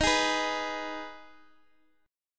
D sharp Suspended 4th Sharp 5th D sharp, Guitar Standard; strummed; chord; x,x,x,8,9,7; JGuitar